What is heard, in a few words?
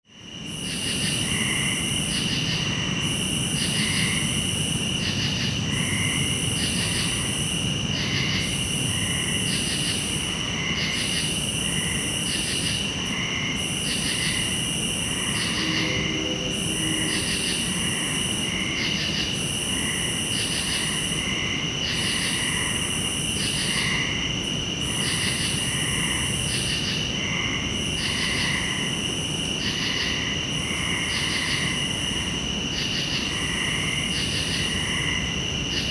Crickets Frogs & distant train